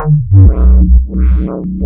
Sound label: bip bip